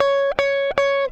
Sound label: gtr trem